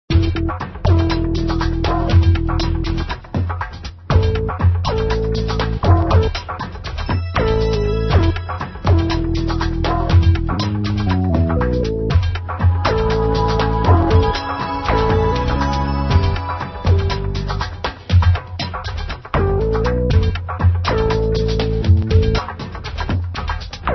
LEGO Technic Drums; Templar Studios; LEGO BIONICLE

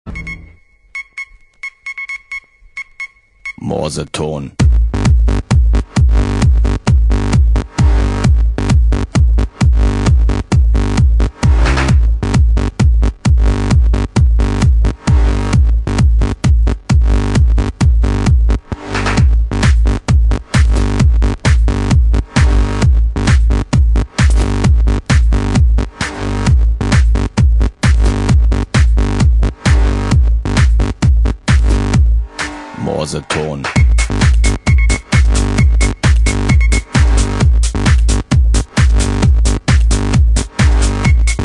Tecktonik Ambiance Electro

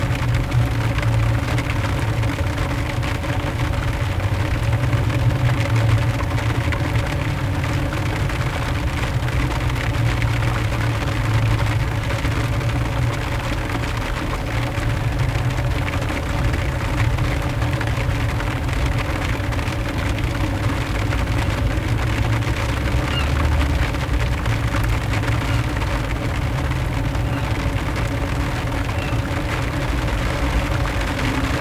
Rain Sounds, Under Shelter Strong

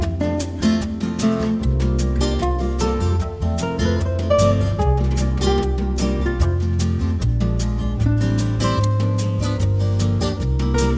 Jazz Bar

